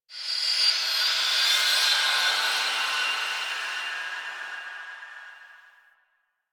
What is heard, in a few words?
Mid Texture